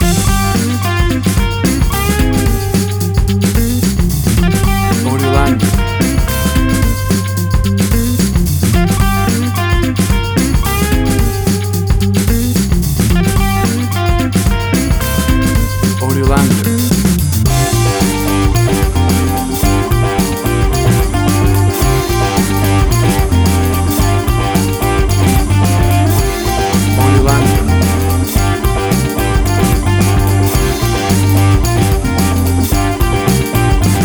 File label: Bluesy Funk Riffing